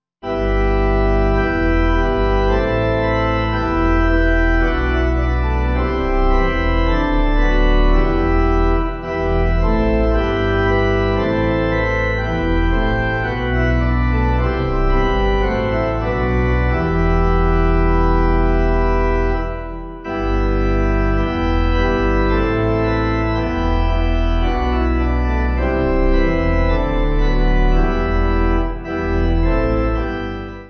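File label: Pipe, hymn backing; Saints Delight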